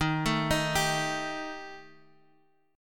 D sharp Major Flat 5th D sharp Guitar Standard, chord; D#Mb5 arpeggiated; 11,12,13,12,x,x; JGuitar